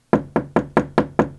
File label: Knocking